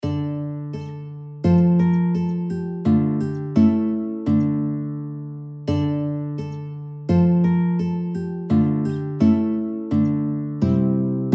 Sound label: bandurka; Oj na gori ta j szenci sznut